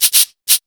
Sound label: 127 DRM, Fresh Disco House